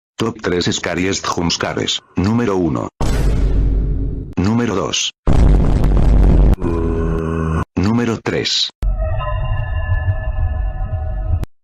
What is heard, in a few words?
jumpscares; numero uno